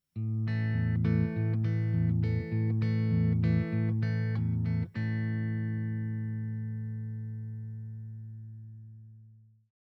A Chord Bass, guitar

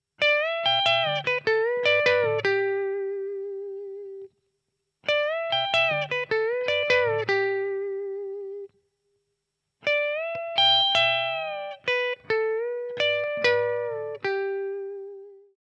Pedal Steel Lick, guitar

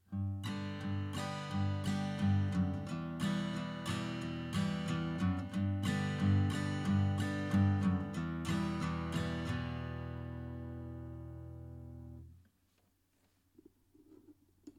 passing notes

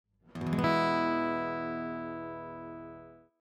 The E Chord

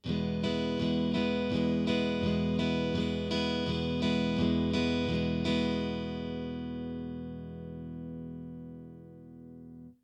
E down and up

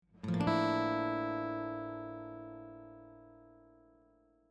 A Chord up 1 fret, guitar

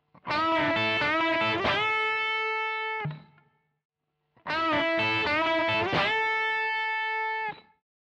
Lick, guitar

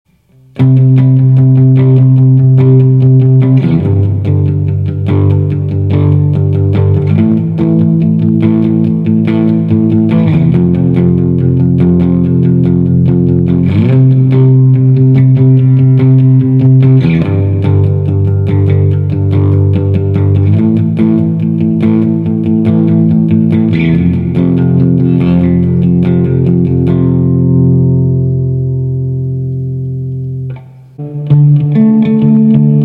power chords, guitar

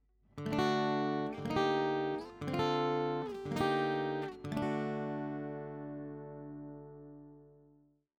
D Chord Ascending, guitar

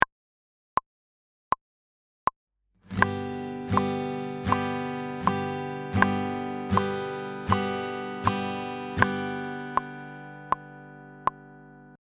Guitar On Each Beat

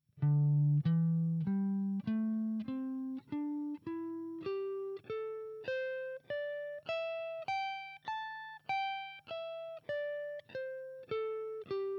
Pentatonic Pattern Diagonal, guitar

